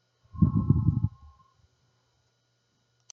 Soft Wind

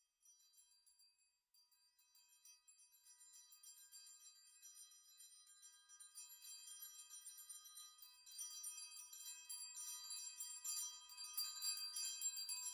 Sleigh bells pass

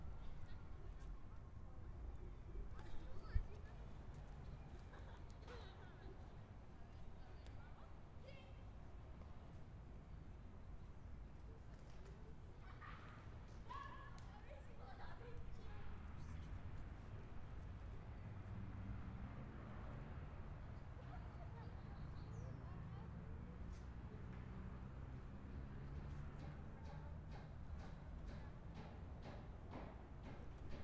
Quiet Mexican Street

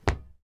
Footstep Metal Crouch

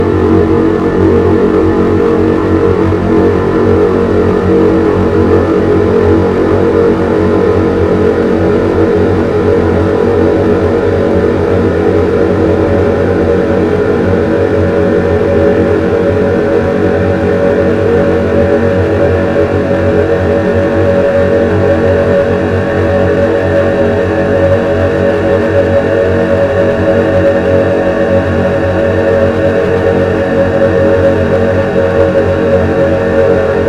Vibrating Low Bass Sound

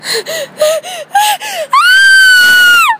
Horror Scream

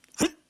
Jump

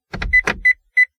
Electromechanical Thunk

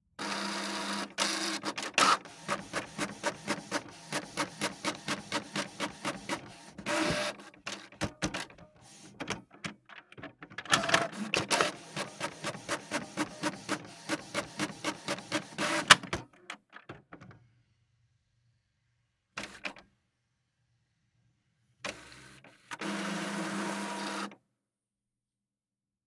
Printer

